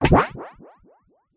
blip then synth whoop ascend echo design synthetic bubble large; skyclad sound